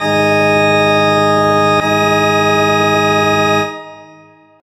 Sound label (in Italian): Quinta pitagorica dissonante